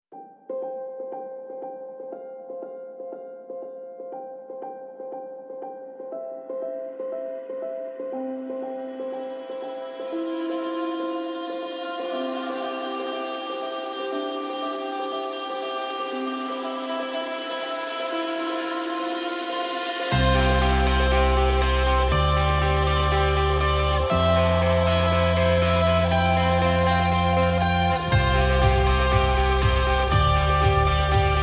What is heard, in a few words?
No Glockenspiel No Lead Guitar Mix